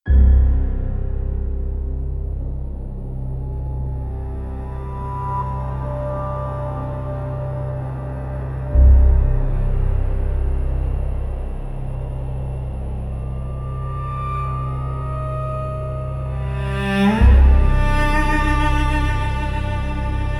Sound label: spiritual spooky soundscapes